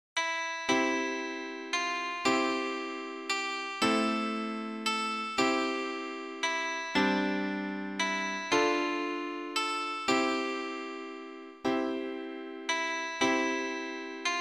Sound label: koto; G chosei